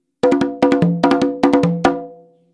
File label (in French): frise, congas